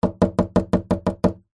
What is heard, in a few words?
wood hollow knocking hand; foley; zapsplat